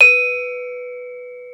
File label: BRONZE, Bronze Gamelan